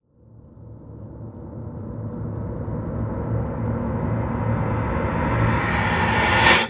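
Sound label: Reverse Screwy Scrape, Cinematic Metal Impacts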